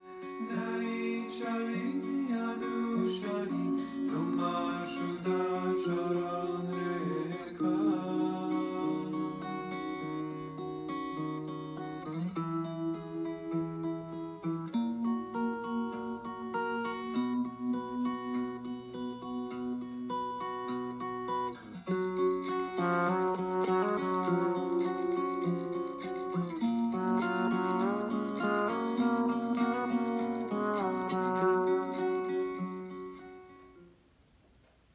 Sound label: Ensemble